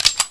slide back, deagle; weapons

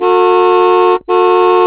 horn, car; carmod

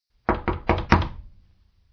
door knock

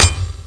gren bounce

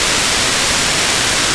gas

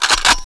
m3 pump

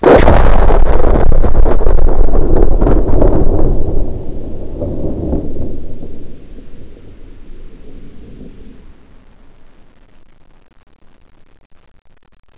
explode

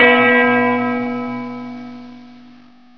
bell

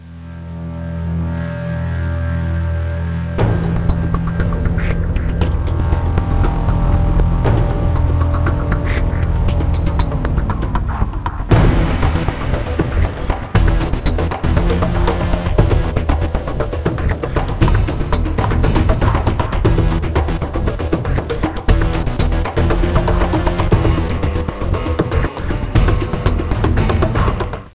start game

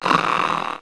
snore; mgs; radio

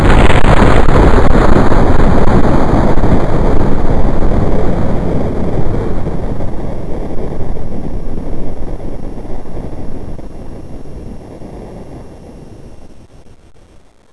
explode

